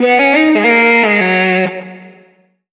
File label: Vocal Sample Pack Phrases B